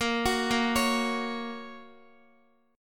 Bb Minor Sharp 5th, Guitar; x,x,8,11,10,8; arpeggiated; 4E,4A,5D,5G,5C,6F; chord shape

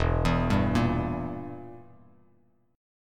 E Diminished E, Bass Standard; Edim arpeggiated; JGuitar

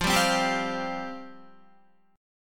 F Suspended 2nd F Guitar; Fsus2; strummed

